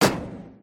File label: metal grate jump